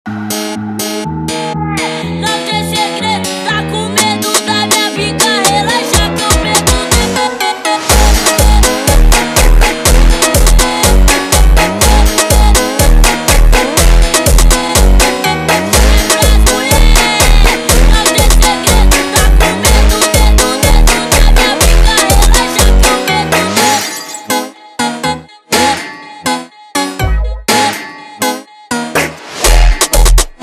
Funk slowed, funk

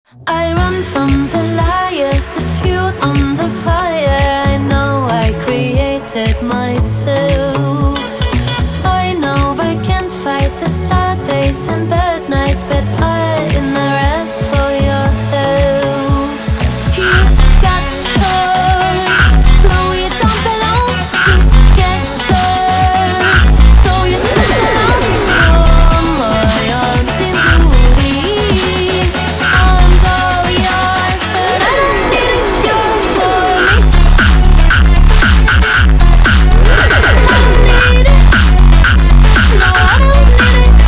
Hardtekk Hardstyle

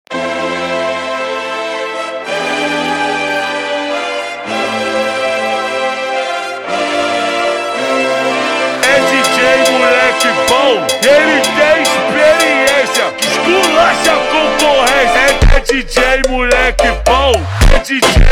Funk slowed

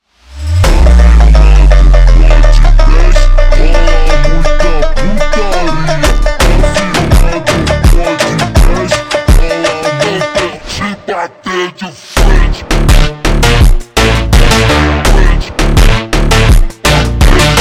Montagem super Slowed, funk

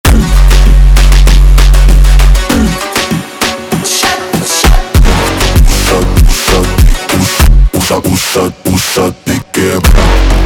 slowed, funk

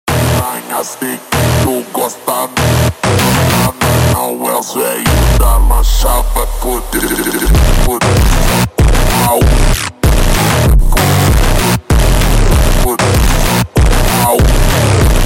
slowed, funk

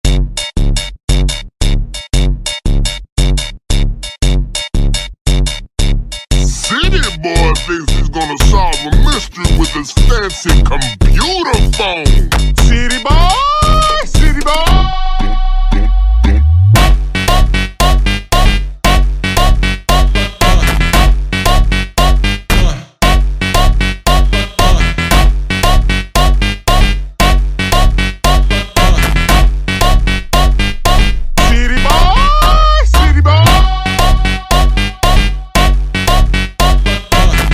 Jumpstyle ultra Slowed, funk

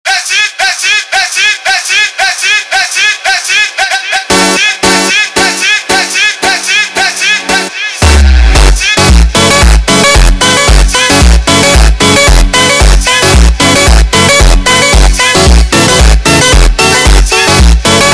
slowed, funk

